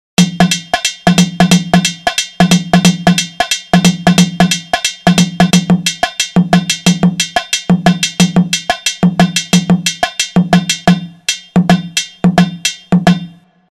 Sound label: nagara